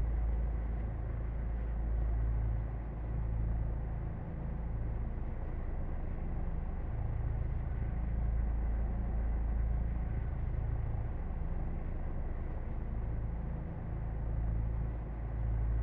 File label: H145 Rotor In right; ec145; Headset